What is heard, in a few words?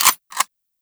Bronco Fire Mech 2D; Warframe; Weapons